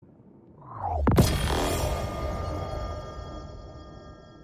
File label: Exotic Drop